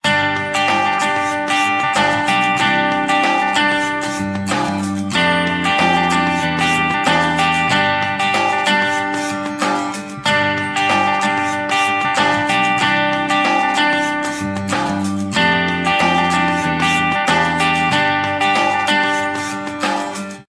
Easy breath, ringtones

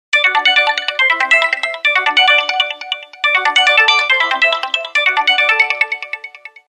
Waterdrop Tone; Samsung; GT-C3212i India

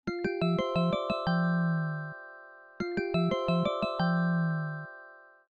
Bubble, ringtones; Samsung Galaxy S26 Ultra

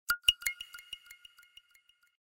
Cheers, notifications; Tecno Spark Go 2022

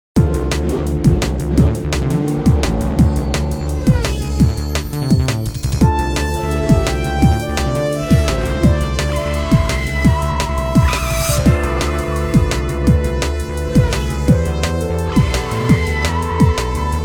Car clock alert; Nokia; audio themes

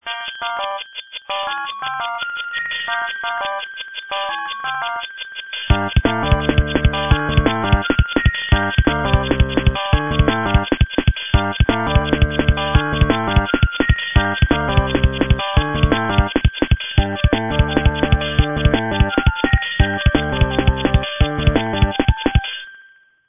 breaks, ringtones; Motorola

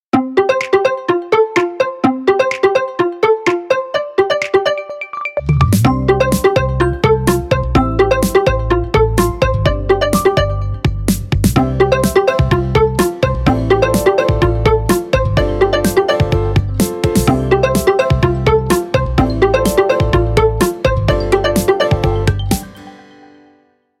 Stunning; Itel; Power-80

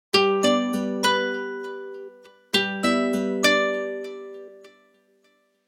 Clock alert, Nokia 6555

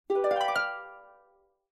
Toggle, notifications; Tinno ATT Calypso 3

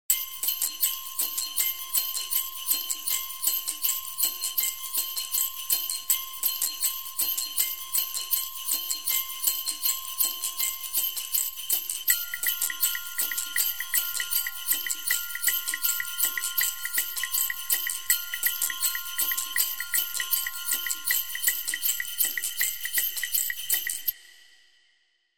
Sleigh Tracks Alarm, Sleigh Bells; HTC

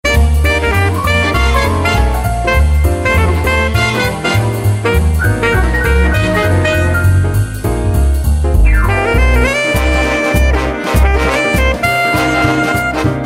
Big Band, ringtones

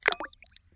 Klock, notifications; Vivo X27 Pro

